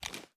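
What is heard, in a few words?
pistol reload fast start; gmod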